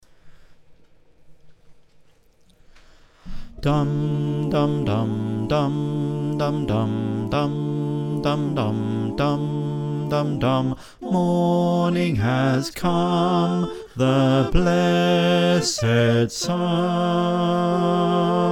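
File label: bass, choir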